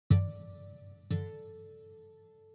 Harmonics

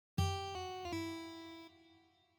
Slides

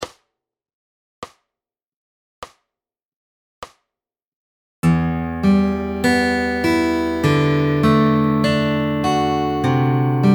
Fingerstyle Fun Lick

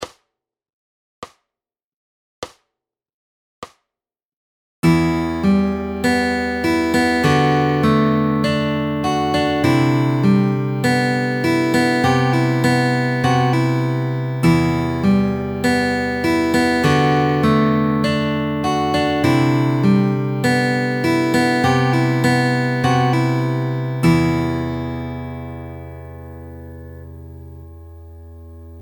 Fingerpicking Both Parts

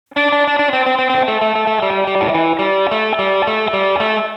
Lick

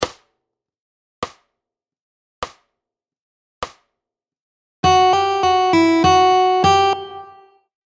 Fingerstyle Fun Faster Lick Variation

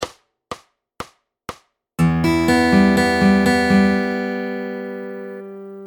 Fingerstyle Easy Classical

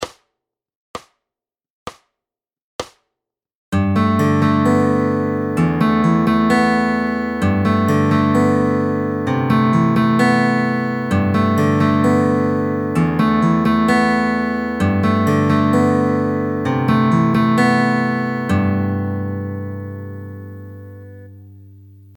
Fingerstyle Chord Anchors

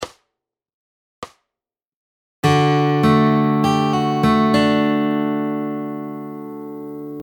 Fingerpicking Lick Variation; Fingerpicking Fun

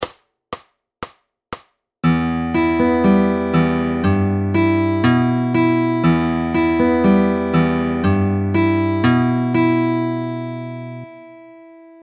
Fingerstyle; Getting Started With Fingerstyle

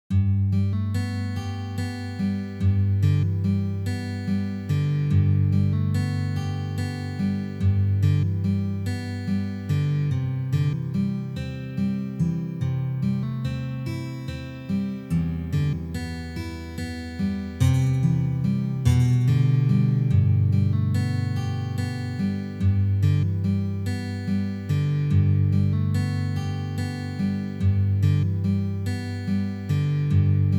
Hammer Ons

